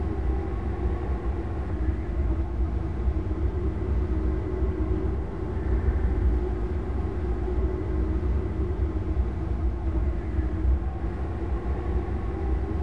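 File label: ambience desert loop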